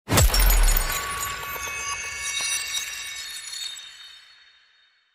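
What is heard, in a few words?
ice magic attack effect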